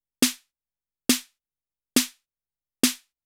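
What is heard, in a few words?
808 snare drum, Techno 138 BPM; KOELN; Best Service ProSamples vol.54; AKAI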